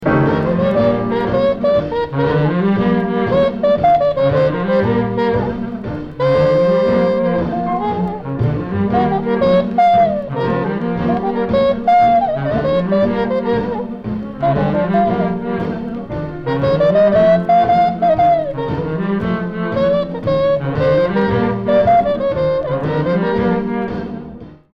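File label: trumpet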